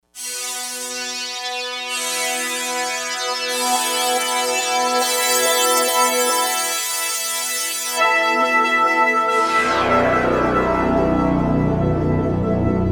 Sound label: Fuzztones; Digital Synthesizer